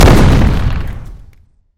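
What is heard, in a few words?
grenade explodes metallic ring; granata vzryivaetsya metallicheskiy zvon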